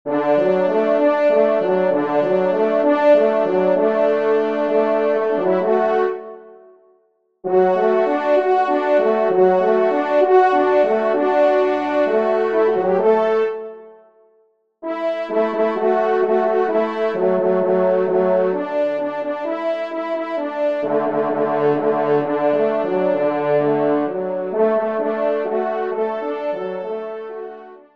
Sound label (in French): polka, trompe; 2e Trompe; en Ré; Ermeneux